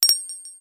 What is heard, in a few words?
microbounce; notification sounds